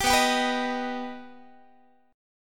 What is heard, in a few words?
B Augmented B Guitar, chord; strummed